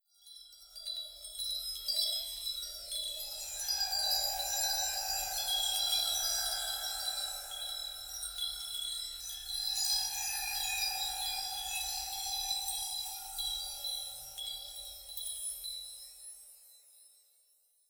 Chimes

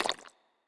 Croaker Run

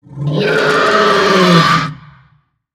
Monster Electrocuted; Monster Lizzie Electrocuted

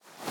Footstep Sand Rev

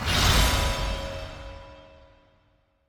Statue Character Heal; Sanctuary NefilisStatue

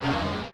Dark Monster voice prepare; enemies

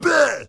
Pirate Barrel; rabbids multiverse; iOS, macOS, Mobile

